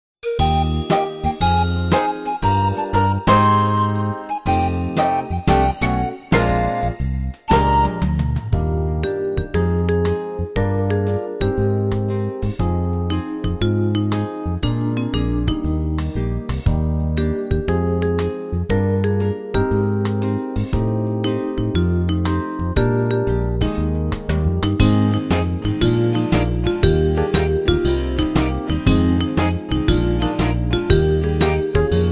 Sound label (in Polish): akompaniament